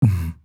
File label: Mouth Bass; Aawaz; Gully Loops